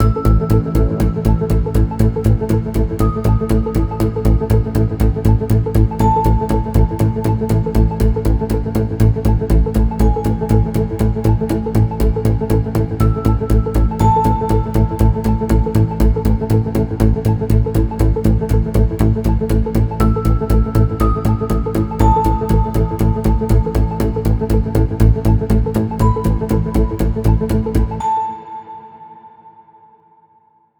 tension project; By Burak Sound Bank; tension gerilim vol 16

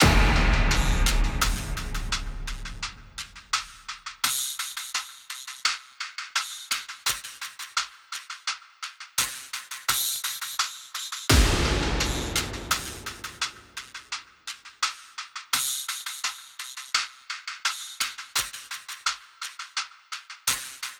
drum comp cinematic; gerilim; By Burak Sound Bank; tension vol 52

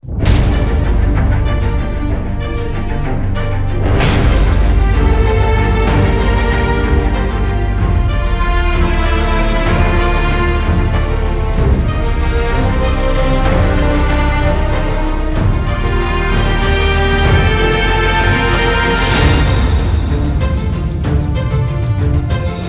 novox cinematic; by burak sound bank; tension gerilim vol 52

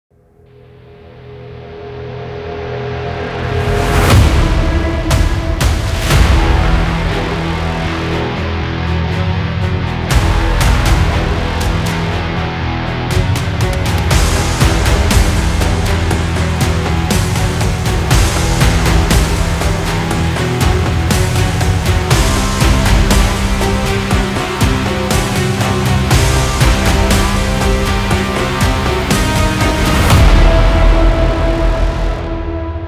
tension; By Burak Sound Bank; tension gerilim vol 21

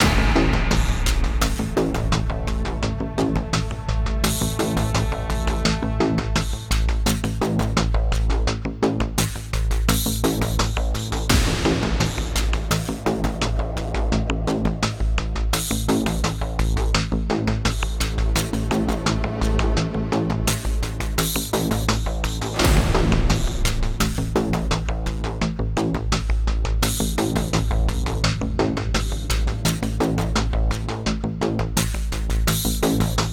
cinematic; by burak sound bank; tension gerilim vol 52

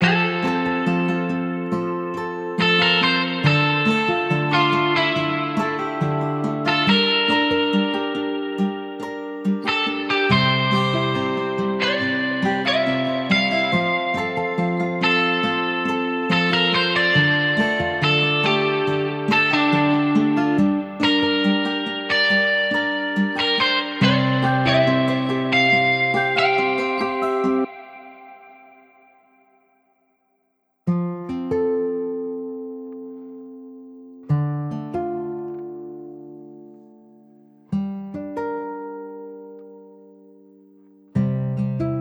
love guitar comp; by burak sound bank; dramatic dram vol 19

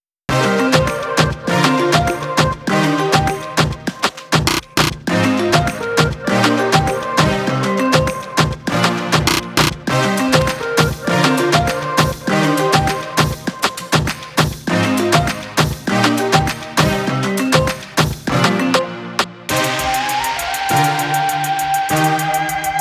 rnb pulse; By Burak Sound Bank; reggaeton tema peketleri vol 3

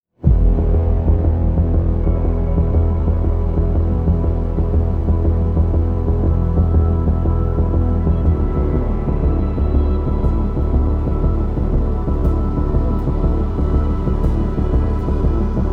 tension bass fx pad; By Burak Sound Bank

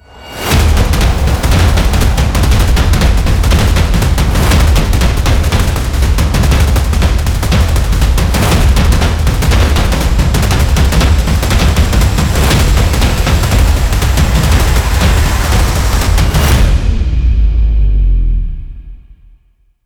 tension, horror excitement thriller song

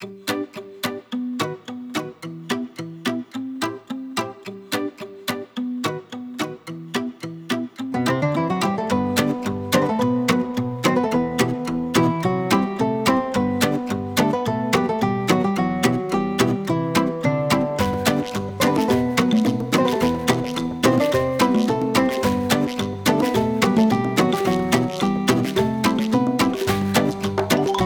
cheerful; by burak sound bank; cheerful vol 22

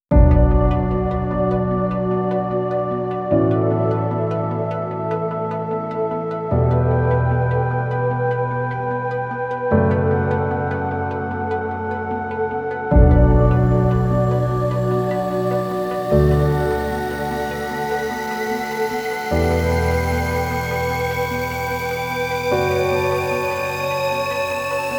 dramatic hasret no violin; By Burak Sound Bank

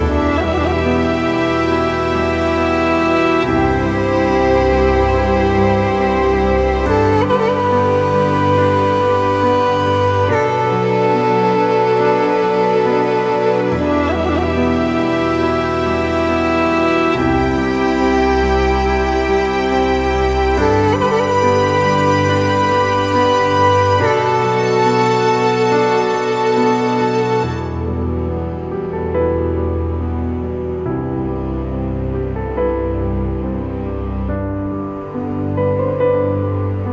dramatic; by burak sound bank; dramatic dram vol 14

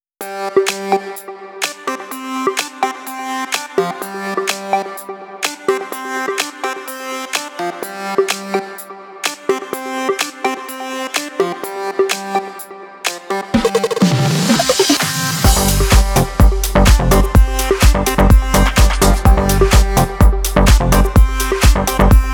house electronic techno; sinyal mesaj3; By Burak Sound Bank; house electronic techno vol 6

